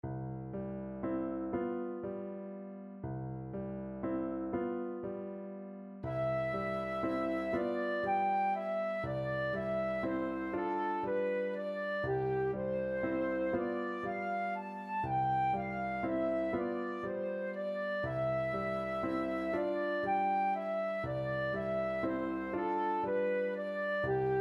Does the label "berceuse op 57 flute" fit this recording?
Yes